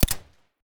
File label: tt33 silencer; STALKER